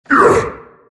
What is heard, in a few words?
grunt; dota; abaddon